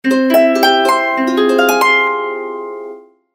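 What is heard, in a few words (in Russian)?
арфа русская мелодия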